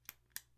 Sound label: Flashlight Click